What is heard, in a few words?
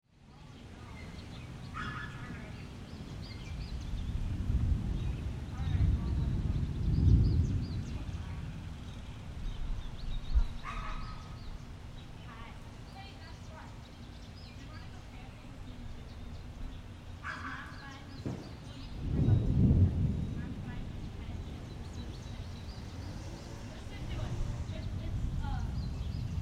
Weather Thunderstorm Approach Neighborhood Ambience; SFX Bible